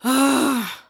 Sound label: Angry